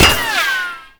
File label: Tank Shield Impact; Warframe; Lasrian Tank